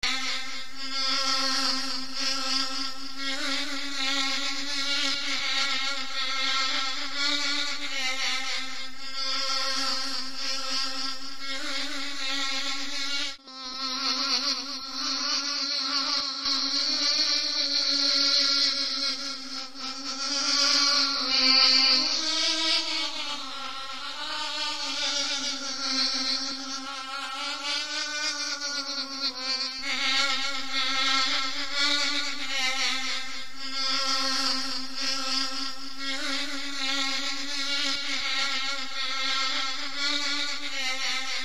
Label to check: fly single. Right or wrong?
Right